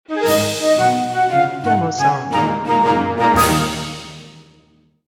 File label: A Lively Playful Mood, Happy Flute